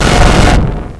beam start, debris

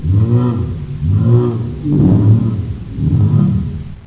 gar idle

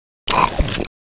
launcher reload, weapons

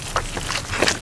alien weapon draw; weapons

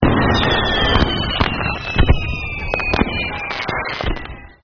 fireworks, ambience

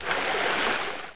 wade

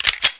ak47 cock, weapons

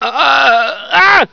scream, scientist

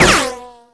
bullet impact

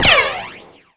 bullet reflect

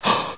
startle, scientist

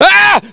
sci fear, scientist

